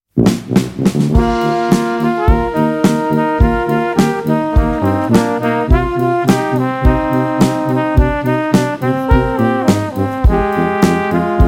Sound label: brass, All-Female Pop & Bravarian Brass Band; Christmas